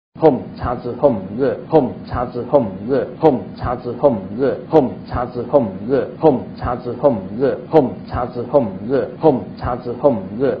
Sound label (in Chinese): 愛染明王心咒 Raga Vidyaraja Mantra